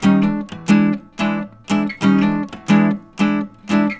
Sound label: Blues Guitar Samples, riffs; ILIO; Fretworks